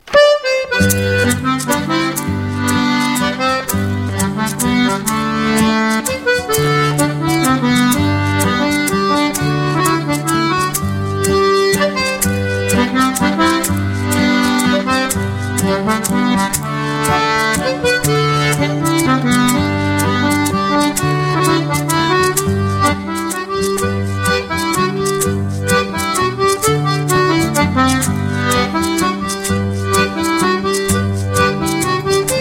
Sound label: mazurka